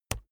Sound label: keyboard laptop enter push hard